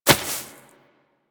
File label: Rodent Hop Step Rear Grass; Characters; Foley; Warframe